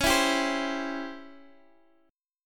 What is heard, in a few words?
C sharp Minor Major 7th Flat 5th, Guitar, Half Step Down